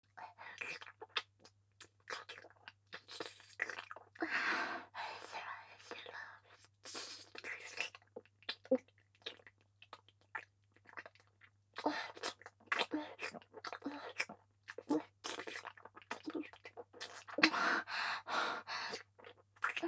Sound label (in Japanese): フェラ（正面下）; ポーラ（妹）